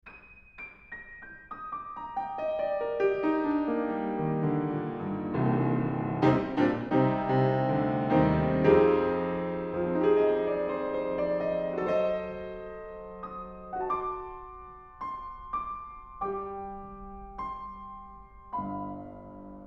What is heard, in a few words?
Piano, Horiz Closed Mic